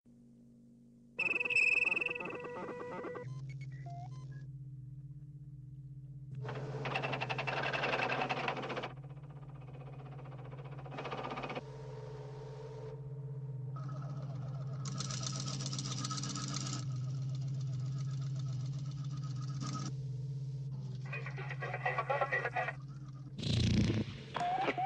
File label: Boot Seq; Nostromo